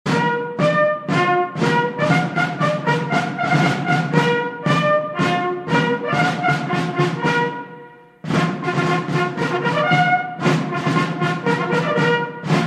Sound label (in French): clairon; aux champs en marchant